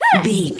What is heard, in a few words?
voice fun small character emote angry